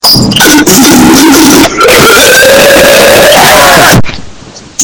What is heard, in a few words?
bass